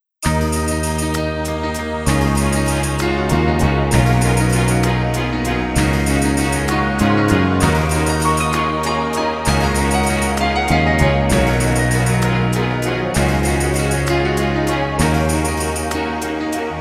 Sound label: Playing The Piano